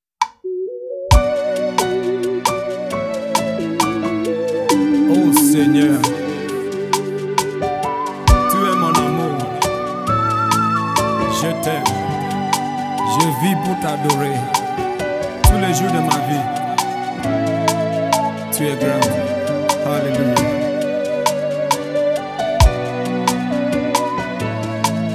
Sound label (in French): Tu Es Dieu accountic version; Mastered with Thunder at 100pct